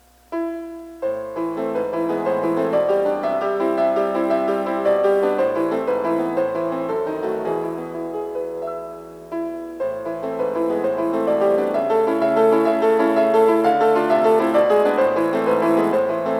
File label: piano sonata in a minor 1st movement